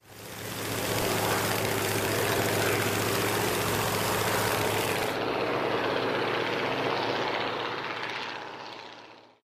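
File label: avion, airplane; commandos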